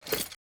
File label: formation editing